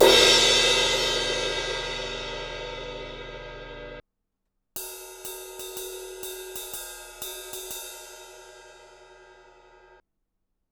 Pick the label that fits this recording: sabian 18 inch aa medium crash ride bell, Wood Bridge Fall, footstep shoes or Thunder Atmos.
sabian 18 inch aa medium crash ride bell